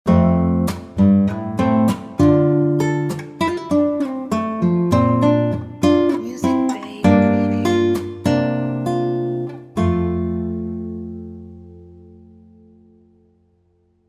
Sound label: Show Ident